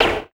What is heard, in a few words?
synth drum lo; Sonic Foundry (Sony Creative Software); Ugly Remnants VOL-2; Beatstuff